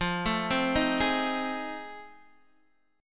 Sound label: F 7th Sharp 9th F Guitar Open D